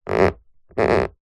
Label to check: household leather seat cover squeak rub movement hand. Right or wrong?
Right